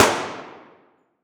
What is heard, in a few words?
Weapon Gun Pistol 45 Caliber Single Shot Interior Shooting Range; AdobeCC